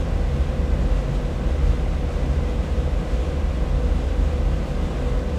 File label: interior; Counter Strike Model Pack; CS:GO; survival